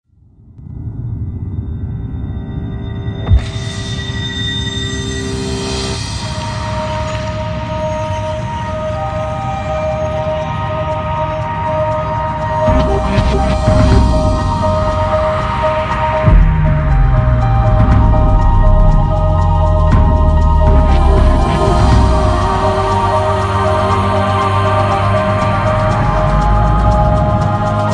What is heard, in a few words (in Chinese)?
纯音乐, 背景音乐; 诺基亚1020宣传视频背景音乐; 抖音